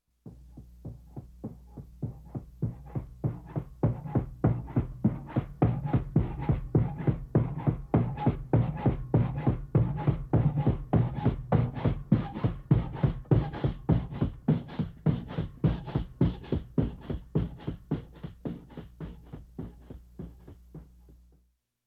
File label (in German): wippende pogostange; Suedsee